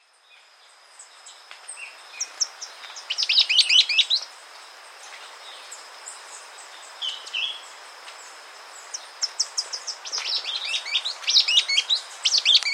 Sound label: Salvadori's Antwren, S. America